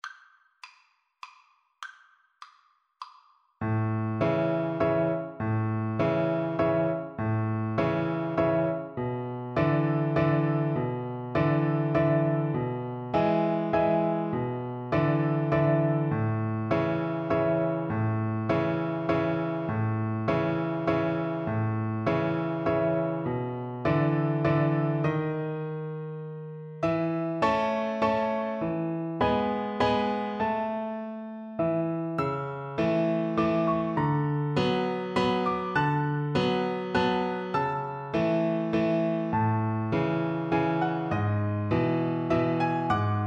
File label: strauss fledermaus viola